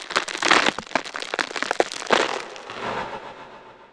overgrowth target; treant

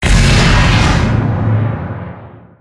vendetta; weapons; dota; nyx

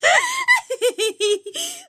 wheel laugh; dota; hoodwink

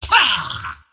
toy pug attack emote; dota; pudge

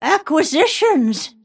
last hit pain; snapfire

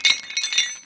chain move; movement; pudge

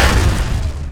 attack impact; Dota 2; lion

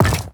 bone equip, inventory

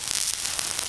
acid spray damage; weapons; dota; alchemist

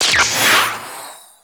ball lightning; storm spirit

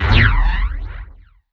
laser impact; tinker

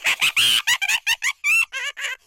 greevil laugh; soundboard

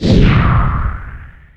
time walk; weapons; dota; faceless void

